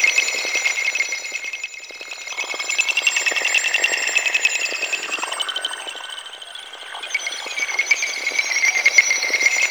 Sentient Cave Anemone Loop; Props; Warframe; Tau; Ambience

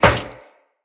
Run Metal Perforated, Footsteps; Tenno

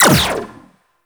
Energy Rifle Mech; Weapons

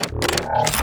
Arch Gun Reload; Weapons; Warframe; Archwing; Hildryn

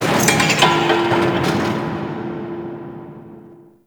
Turret Moves, Fortress Ambience; Warframe; Grineer

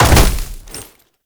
Land Heavy Vegetation, movement; Warframe; Uriel

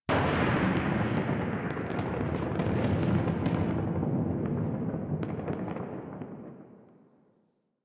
Ambient Distant Explosions; Warframe; Nineteen Ninety Nine

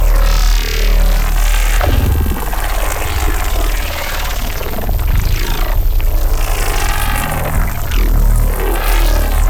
Ram Sled Breach Loop; Sentient; Sentient Ramsled Agent

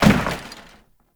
Janitor Cart Impact Short; Ambience; Gameplay; Warframe; Nineteen Ninety Nine

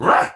Attack Effort; Warframe; Tau; Dax; Ashigaru

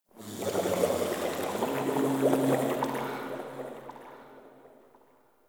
Covered Vine Area Wet Gurgle; Ambience; Misc Outdoor; Warframe; Infested Eris

